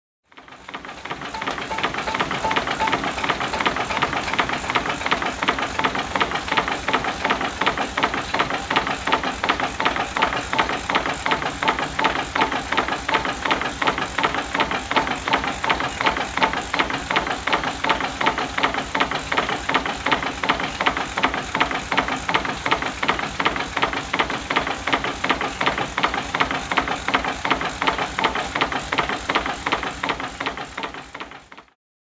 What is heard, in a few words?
Old Tractor engine running